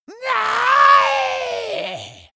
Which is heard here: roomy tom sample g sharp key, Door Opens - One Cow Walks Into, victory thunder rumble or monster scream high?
monster scream high